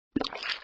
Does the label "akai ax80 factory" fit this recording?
No